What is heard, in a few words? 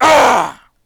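hit, male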